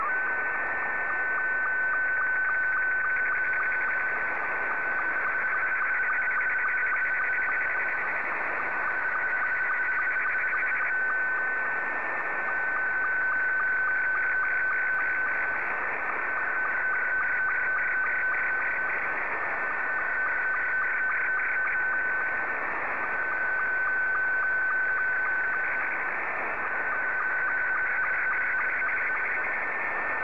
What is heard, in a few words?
sstv; VK7ZGK; VK4MSL SSTV RX @ QG62ln